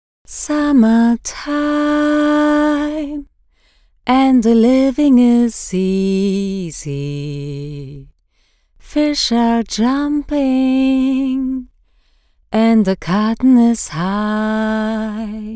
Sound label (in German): Gesang melancholisch; LOFT VOICES